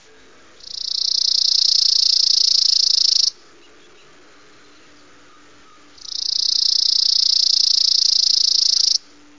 spizella passerina

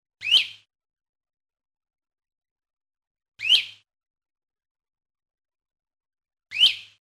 spotted flycatcher

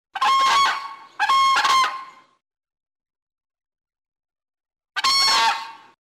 whooping crane

